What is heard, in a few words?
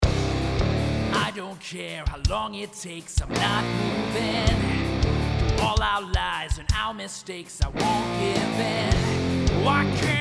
Track Telefunken U47, virtual mic